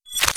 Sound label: ordnance disarm